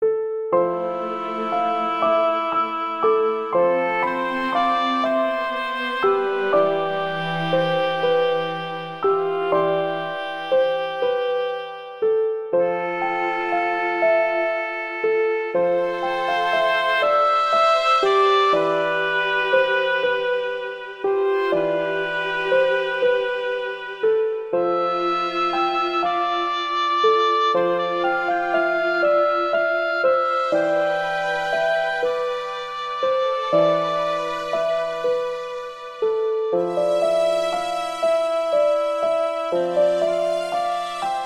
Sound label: A Good Day Loop